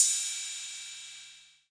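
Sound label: 808 cym, cymbal, drum machines; 808 Extended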